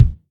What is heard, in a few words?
Kick